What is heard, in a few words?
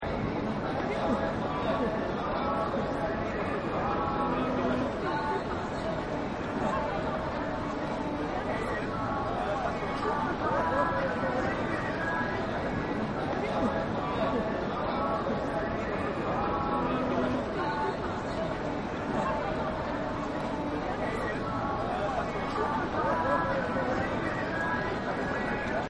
Street Ambience Tokyo; Cities; Backgrounds